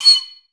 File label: STAC C7, Advanced Orchestra; Best Service; Peter Siedlaczek's Advanced Orchestra